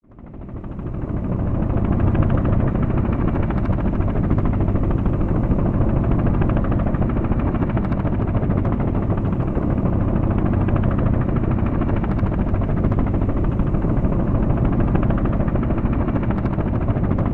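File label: Apache Heli Hover Distant; AH-64 Apache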